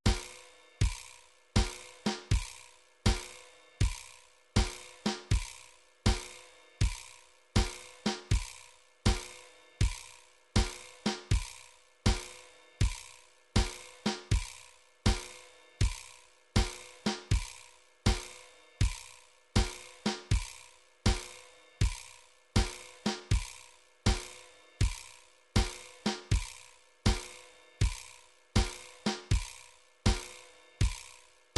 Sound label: drums, midi drum patterns; midi drum patterns vol 1 to vol 4